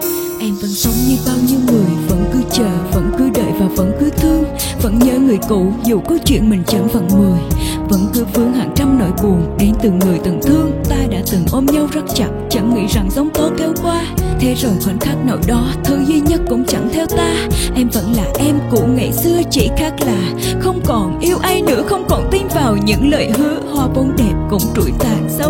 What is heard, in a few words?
RAP